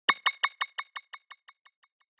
Onoma Surprise Low Long

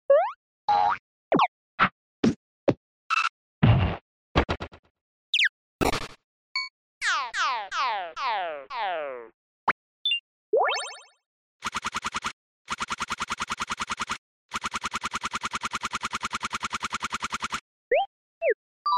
SNES Action

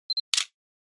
Camera Phone

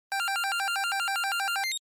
GB Shooter Score

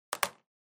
Ballpoint Pen Put Down

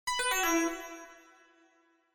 Chime Announce

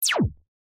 Cyber

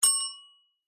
Call Bell Tap Style

